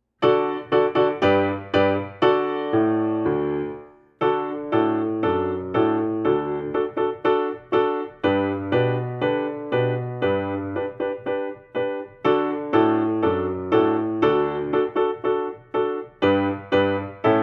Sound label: Marching Along 120